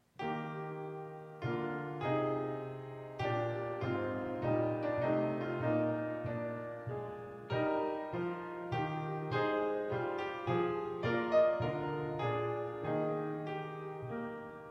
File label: Minuet